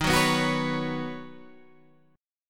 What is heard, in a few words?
Eb Minor 7th Sharp 5th Eb Guitar Standard; strummed